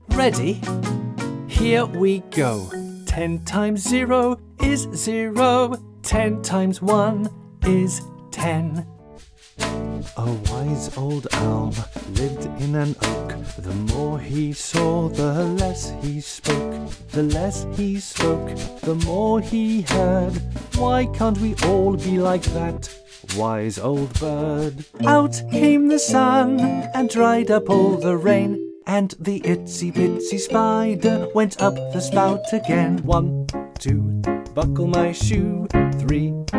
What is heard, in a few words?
Voice-Over Children Write Songs